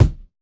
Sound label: kick, Roland MC-909